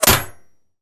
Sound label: Bullet Impact Metal Light